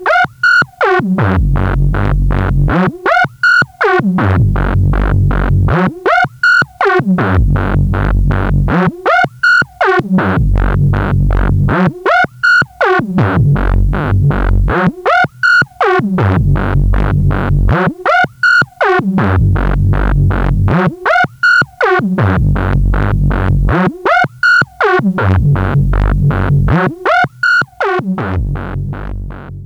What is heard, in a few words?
RINGTONE